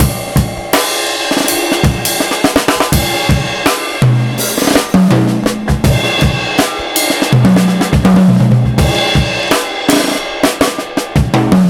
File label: Break Squashed 82 bpm, Drums; Loops; Ableton Live 12 Lite; Core Library